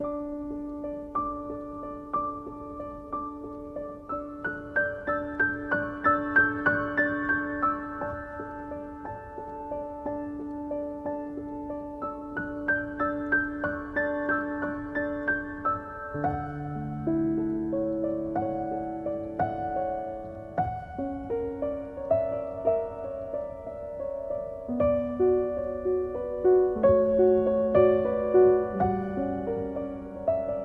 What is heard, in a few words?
Piano Reprise